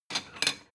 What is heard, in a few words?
plate small take slide hard